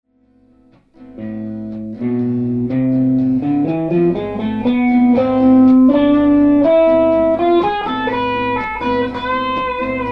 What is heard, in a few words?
a minor with solo, guitar